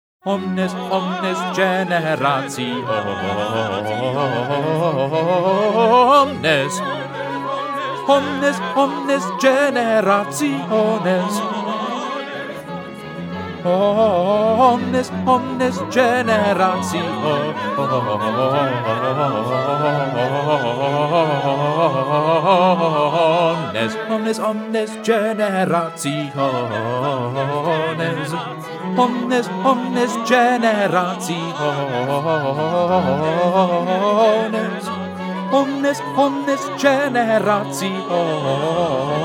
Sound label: Magnificat bass; bach; magnificat 4